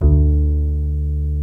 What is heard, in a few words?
upright bass; Greytsounds VOL-1